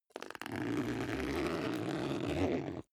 zipper backpack open slow

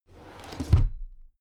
drawer cabinet crammed close regular halfway

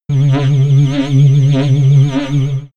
alienation

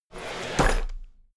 drawer cabinet equipment open heavy

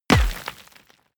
bullet large impact dirt

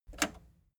plastic button slack release

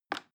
plastic button little release regular

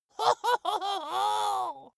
dwarf laugh exaggerated

